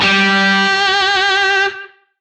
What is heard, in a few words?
Shawzin G; Oct Two; Music; DE; Warframe; Lizzie Shawzin